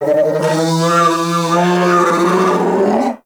Player Lure Call, Animal Lure; Warframe; Deimos; Predasite

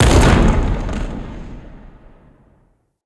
Siege Distant Explosion; Level Events; Midrath; Warsong Prologue

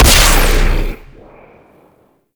Experiment Riffle Shot, Weapons; Warframe; Corpus; Sent Experimental Riffle

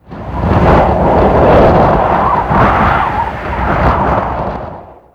Mountain Pass In Ear Wind Gust; Ambience; Warframe; The War Within

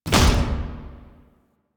Missile Swarm Mech; Vehicles; Crew Ships; Weapons; Warframe; Rail Jack; Corpus Crew Ship

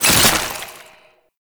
Wrist Gun Alt Charge Stop; Weapons; Warframe; Tenno

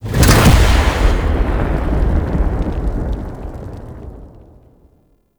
Interior Orbital Station Pod Eject; Warframe; Tau

